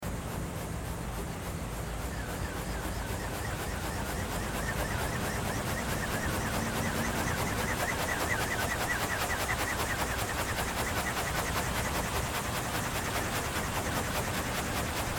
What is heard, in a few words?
windmill